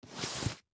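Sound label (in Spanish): LEVANTARSE SILLA, getting up from chair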